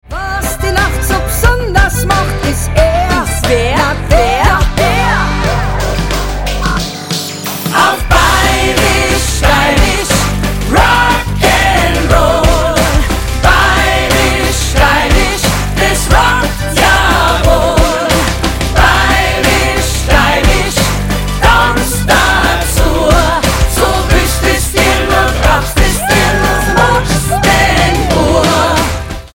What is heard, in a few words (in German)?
Bayrisch Steirisch Rock n Roll